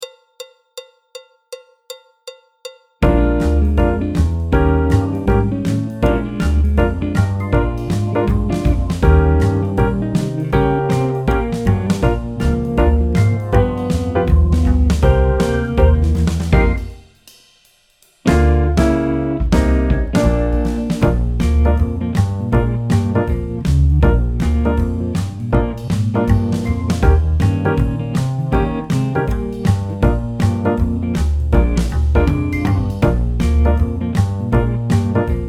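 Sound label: backing track, Jazz; clarinet, flute, tenor; Jazz Syllabus Level 1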